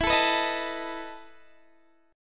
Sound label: E Major 7th Suspended 4th E, chord